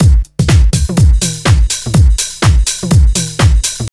house loop

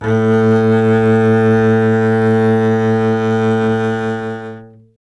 STR CBS, Solo Instruments

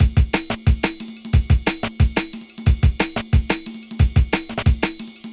Latin Beat, Drum & Bass, Beats & Breaks; Fluid Dynamics

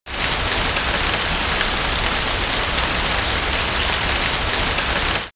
Nature Drizzle; X-Static Goldmine

